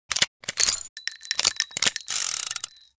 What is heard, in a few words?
bull reload, raging bull